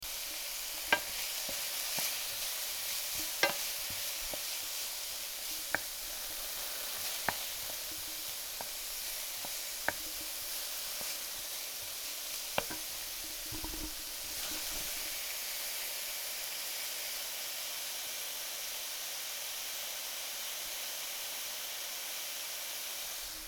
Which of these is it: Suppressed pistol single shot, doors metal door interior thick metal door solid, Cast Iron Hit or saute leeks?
saute leeks